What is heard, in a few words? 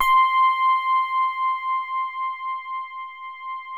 DELICATE, DX RHODES; Best Service Advanced Media Trax Modern Composer